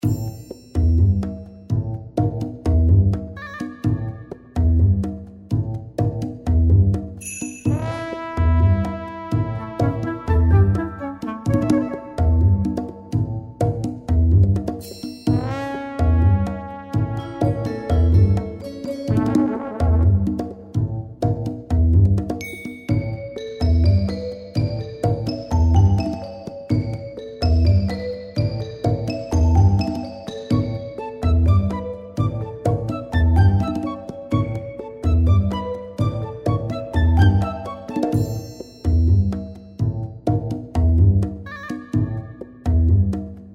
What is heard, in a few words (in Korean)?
엉뚱한, 황당한